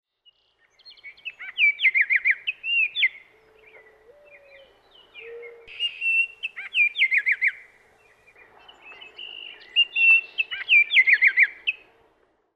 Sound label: Baltimore Oriole ICTERUS GALBULA; EXOTIC BIRDS